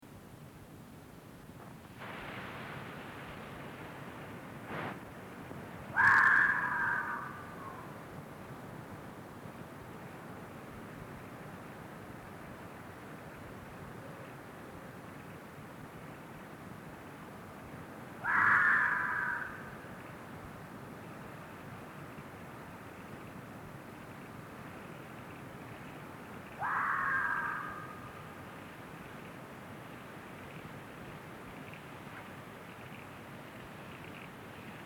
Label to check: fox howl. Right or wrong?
Right